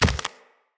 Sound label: Root Spider Mother Step Dirt